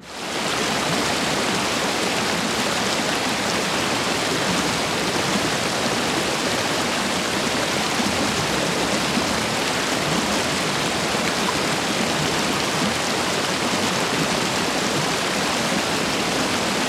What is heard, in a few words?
submarines engines; World Of Warships